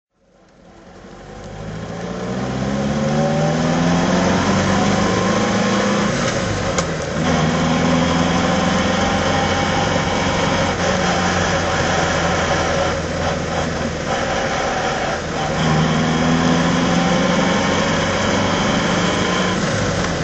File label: mg tc drive